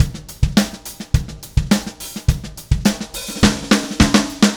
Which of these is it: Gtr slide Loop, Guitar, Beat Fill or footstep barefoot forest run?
Beat Fill